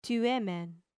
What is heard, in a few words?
tuemen, grec mezzo